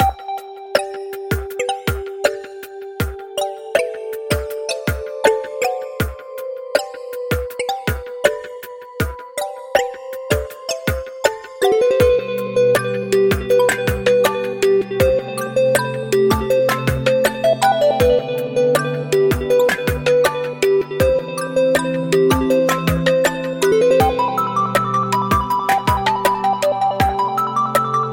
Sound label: Playful Quiz